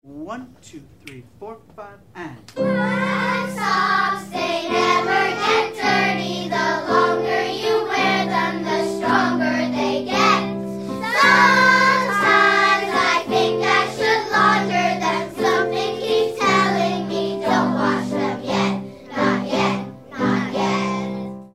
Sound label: chorus